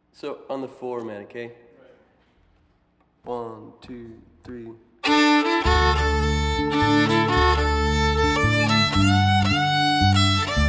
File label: Interlude